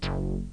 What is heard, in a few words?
Sound klunk